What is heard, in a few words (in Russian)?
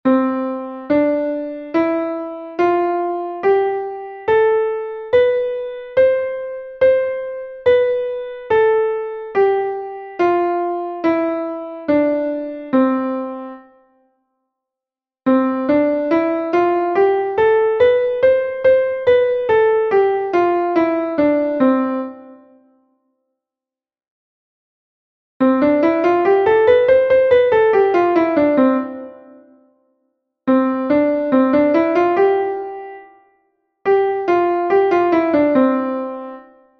shestnadcatye v do mazhore; solfedgio